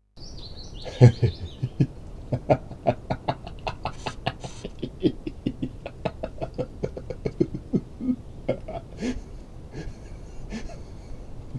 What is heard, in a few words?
laughing